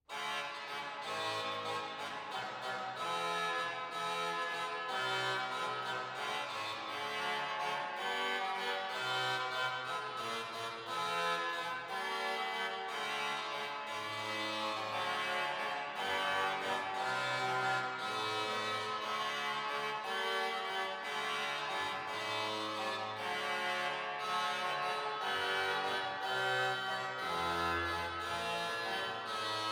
Improvisation Tromboncini; Nakic; Izola

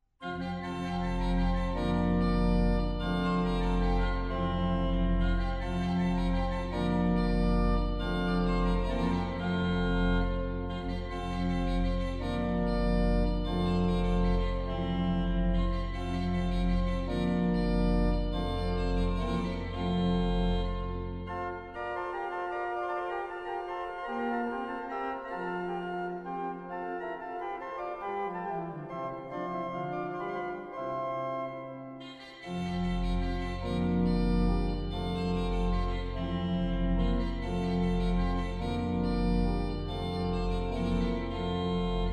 Silbermann Musette; 1732; Ebersmuenster; Rameau

